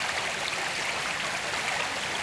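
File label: water run loop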